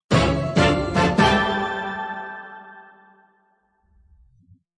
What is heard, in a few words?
win; 弹球音效